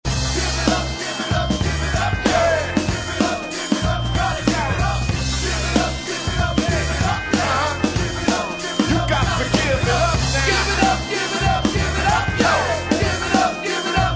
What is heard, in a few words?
DIRTY DRUM MEMPHIS MIX, from refrain